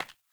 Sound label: Sandals Run Default, Footsteps; Characters; Player